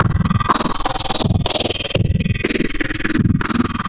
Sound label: breakcore breaks